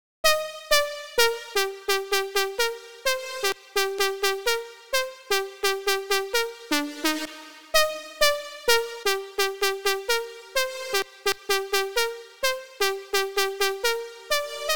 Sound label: 128 BPM C Min Lead; House; Drop Loops; Cymatics; Golden Hour